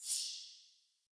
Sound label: XP Tick; UI